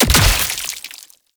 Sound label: Dismember Gore Impact; Warframe; Bullet Impacts